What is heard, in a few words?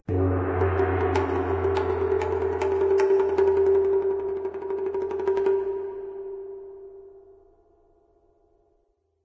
Japanese Music Intro; Diner Dash 2 Restaurant Rescue